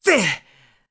Throw; Link throwing an object; zelda sounds; Skyward Sword

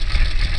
Water Temple Statue Level Loop; Zelda; OOT

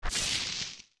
Steps Tall Grass Land; Jumping and landing in Tall Grass (adult); OOT

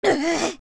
Young Fall Down; OOT

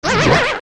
Die; Bigocto; zelda; OOT

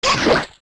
Stalchild Hit; zelda; OOT

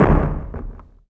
Ship Platforms End

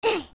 Young Push; Link pushing a large object

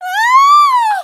Scream; Hilda screaming (turned into a painting); zelda

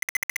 Sea Urchin Push; Zelda

